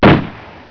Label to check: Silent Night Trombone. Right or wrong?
Wrong